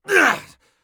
bear2 hit; Escape from Tarkov

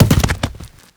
potato hit brick

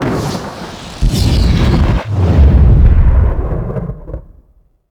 mohole discharge

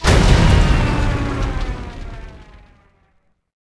Plane Die; Civ4 warlords

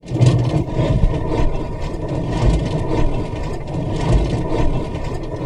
P-51D cockpit canopy close, aircraft; DCS

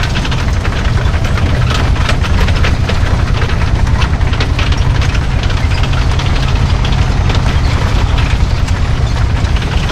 maus tracks, tanks; War Thunder

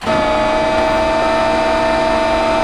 Aircrafts Flaps; DCS

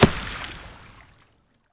water hit, damage; DCS

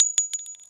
9mm shell concrete; Escape from Tarkov

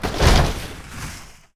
objects, World of Tanks

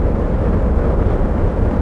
wind in ear

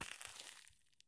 soil walk; Escape from Tarkov

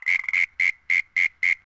rock frog; ambient; World of Tanks Blitz

